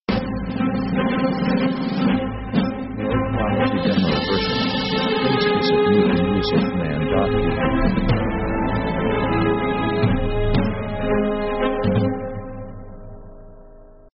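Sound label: orchestra riding chase; Action themes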